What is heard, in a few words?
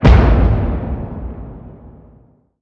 explosion grenade frag low